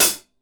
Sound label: SHORT HIT, HI-HATS; Sampleheads; New York City Drumworks VOL-1; KD HI-HATS